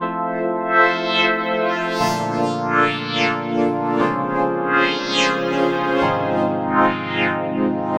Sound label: Marian String; Strings & Pads; Sonic Foundry (Sony Creative Software); The Electronic Music Manuscript Collection